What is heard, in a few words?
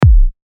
kick; massive